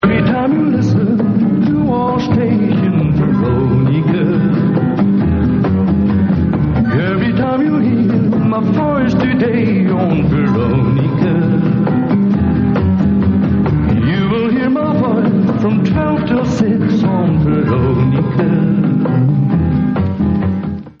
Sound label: jingle; r veronica; 1980